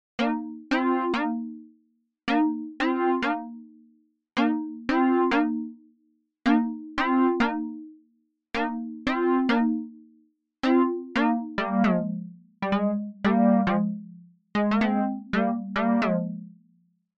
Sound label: PolySynth